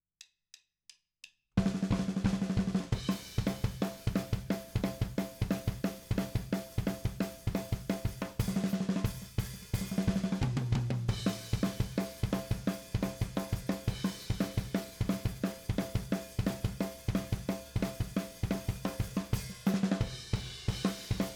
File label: Drums; Bridge